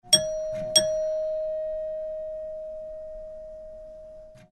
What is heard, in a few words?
Ding Ding, doorbell